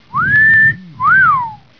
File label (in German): pfeifen